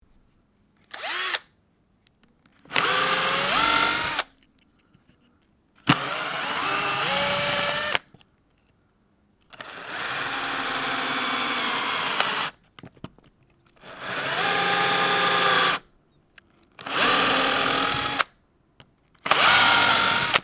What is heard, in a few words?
carpenter, صدای نجاری